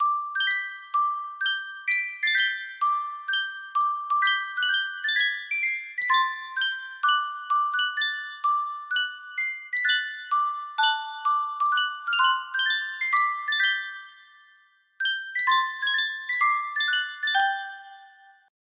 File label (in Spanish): Yunsheng caja de musica, music box melody